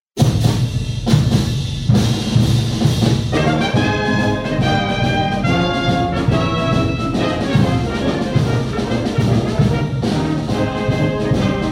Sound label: Drum Roll Reverb